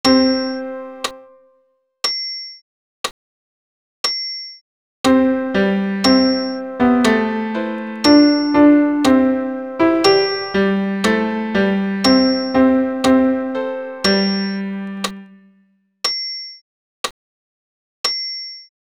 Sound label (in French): Et in spiritum alt pian acc